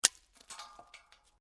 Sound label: Metal Object Small Dropped; Materials